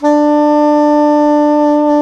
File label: SAX SOP SAX, Soprano Long